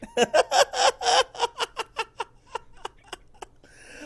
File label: awesome laugh